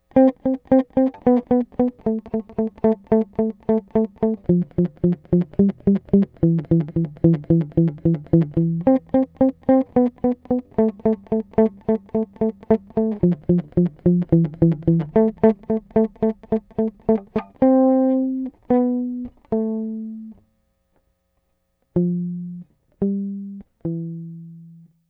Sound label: slap single note, bass; mike lull